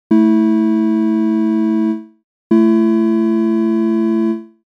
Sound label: Comparison 23edo fifths